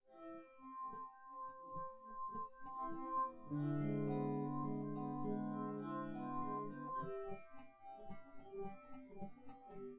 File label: no drums synth conv 1m sim; SASSEC07